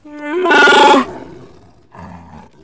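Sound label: Wookiee female battle; Star Wars Knights Of The Old Republic I; XBOX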